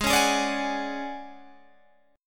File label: D Major 7th Flat 5th G sharp, chord